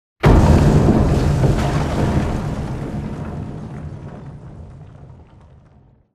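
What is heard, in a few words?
Explosion Rumble Interior Distant Texture; Boomtown; Titanfall 2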